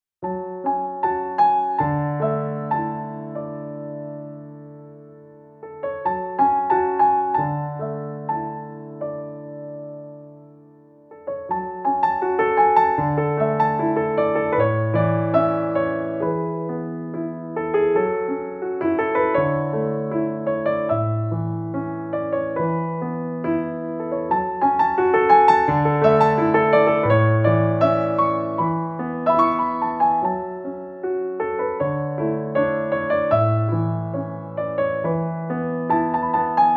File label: Piano, Klavierspieler; ModernJukes; Hannover; Hochzeit; Empfang